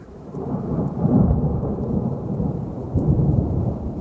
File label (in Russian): eho nebes dalniy golos; zvuki buri